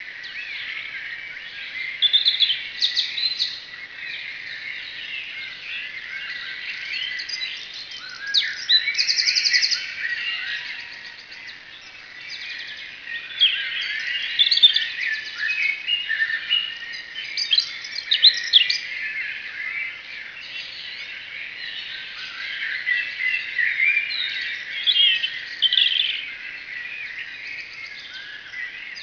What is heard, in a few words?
birdies